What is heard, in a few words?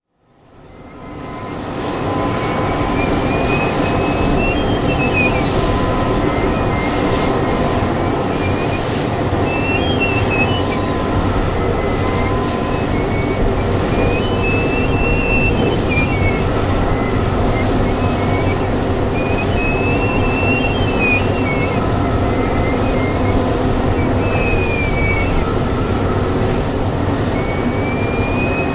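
sounds of a wind turbine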